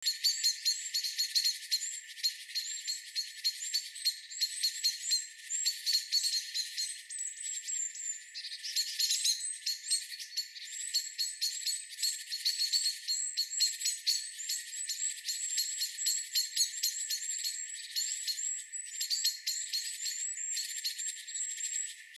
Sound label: Turdus merula; SETE